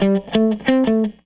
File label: Les Guitar Loop